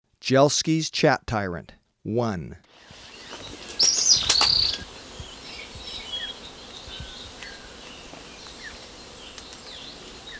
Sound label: Jelski's Chat-Tyrant, Birds of Ecuador; S. America